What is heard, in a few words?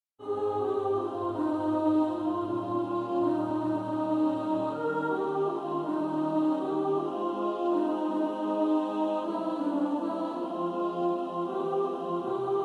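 soprano, Practice Music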